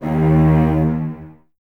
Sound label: Cellos D#2; Miroslav Vitous; String Ensembles; LINKS ORCH